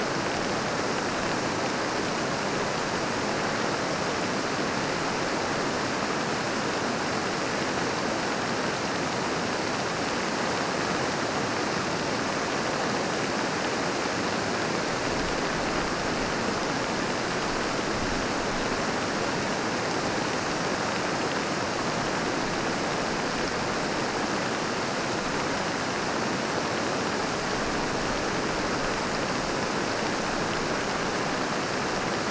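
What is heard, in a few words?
Water Bubble Water Boil; water sounds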